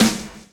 DRUM CHOP; AMG Gota Yashiki Groove Activator

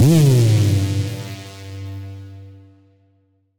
Siren Horn down, Siren-Horn Type Hits, Ramp Down; future rave

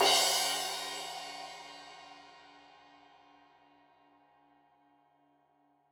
Crash 15in L edge, Cymbals Stereo

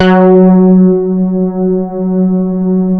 SH 1000, BASSES

